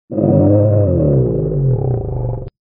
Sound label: whomps; vapesquared